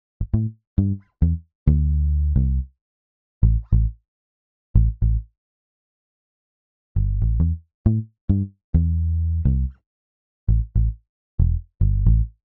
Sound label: Island Reggae Bass